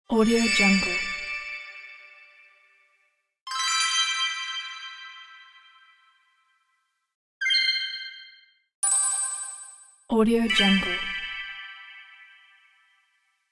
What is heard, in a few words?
fantasy game blessing pack